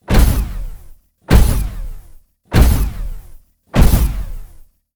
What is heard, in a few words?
mecha walk